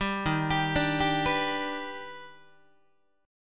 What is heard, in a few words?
Eb Augmented Major 7th Eb, Guitar; arpeggiated; 10,11,10,12,11,10; 4A,4E,5A,5D,5Gsharp,6Csharp